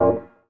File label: button